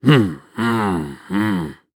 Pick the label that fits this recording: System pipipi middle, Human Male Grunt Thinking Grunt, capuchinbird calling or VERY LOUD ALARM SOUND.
Human Male Grunt Thinking Grunt